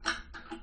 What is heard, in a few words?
flush try, toilet; sfgame